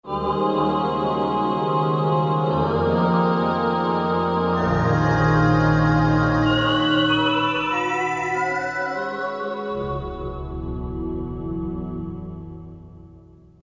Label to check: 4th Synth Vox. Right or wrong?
Right